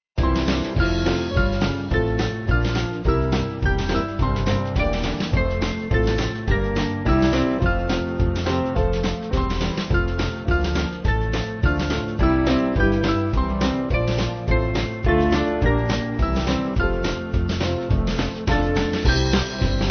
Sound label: Band